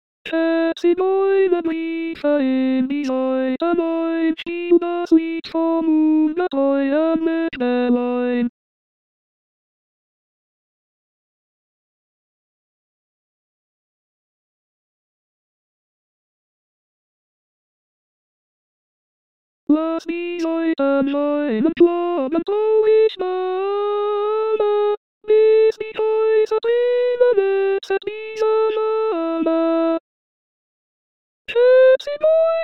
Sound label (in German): Zigeunerlieder alto